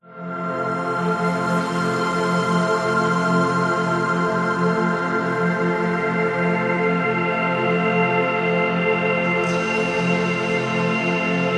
science fiction atmosphere glorious opening landscape wonderous; zapsplat